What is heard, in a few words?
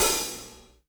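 open hi-hat Acoustic Crush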